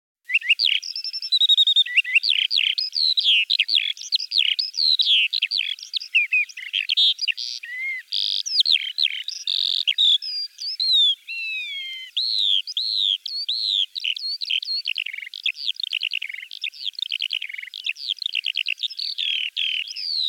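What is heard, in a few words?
skylark voice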